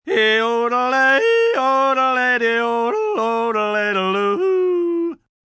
Yodel Slow, Yodelling, male, sung, slow and low, drunk, funny, comedic, cartoon (human voice); Funny Sounds; VoiceBox